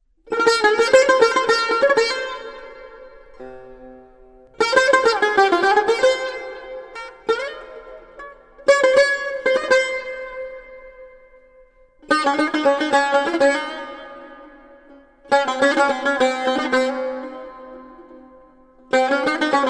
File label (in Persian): Taknavazi Tar (Shour)